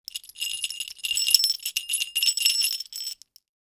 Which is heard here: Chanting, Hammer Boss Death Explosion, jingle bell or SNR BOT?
jingle bell